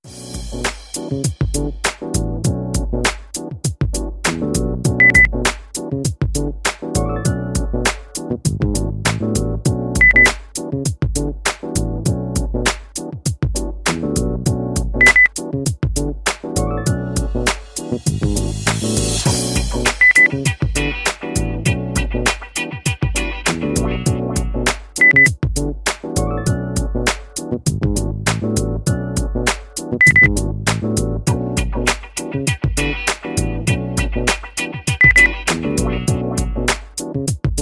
groovy

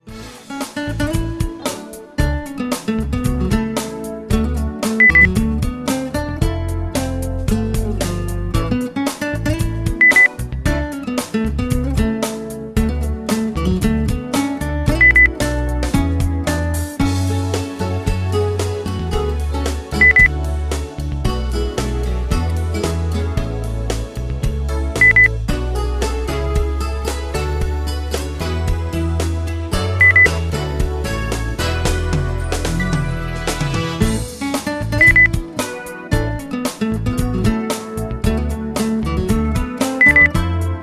pop

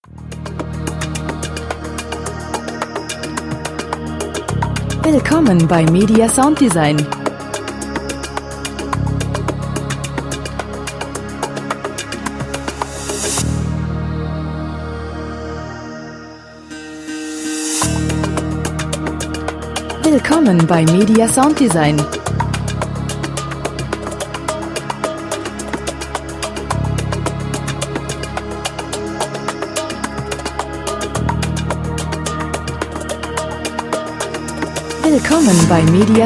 percussion

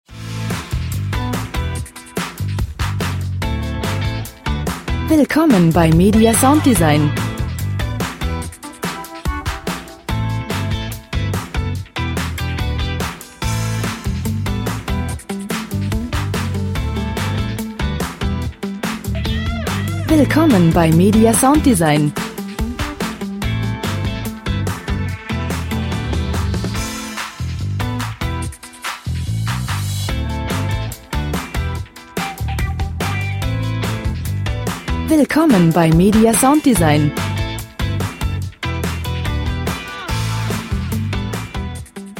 groovy

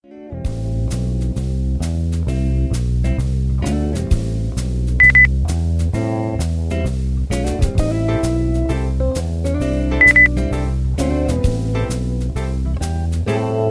jazz beep

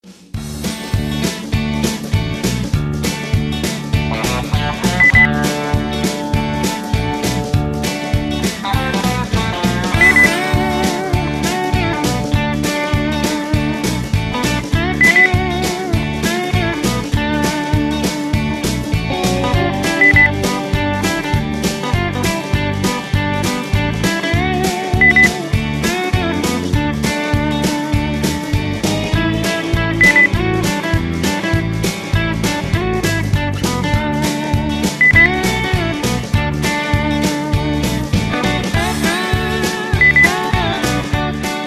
usa country; urlaub

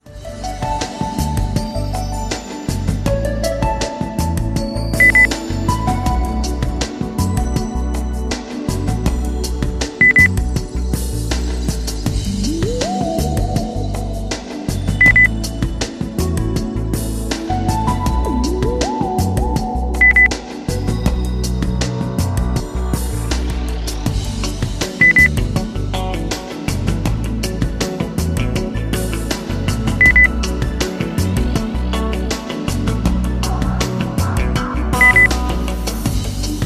lounge

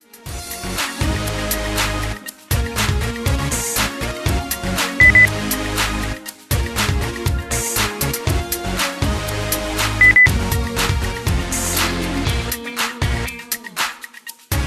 groovy

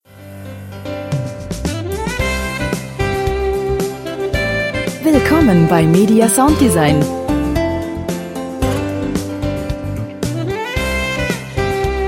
pop happy song

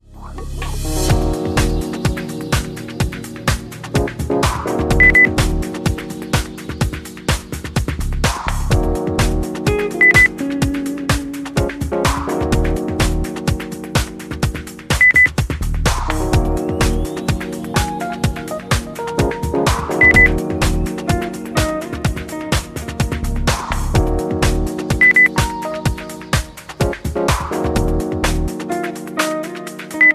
lounge

